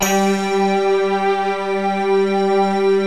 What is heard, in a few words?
MYSTERY SYNTH